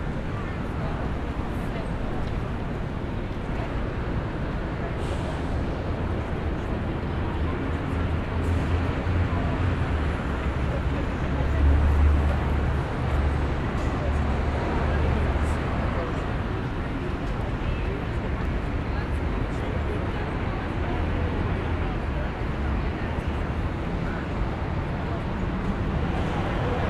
Urban day traffic people busy; urban and suburban; boom library

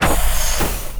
Pickup Scifi Health; SFX Kit

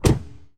Lamborghini Door Close, Automobiles

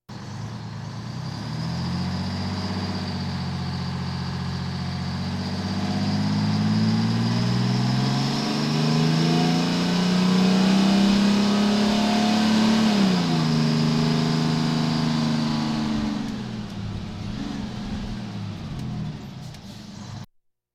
Police, Fire engines

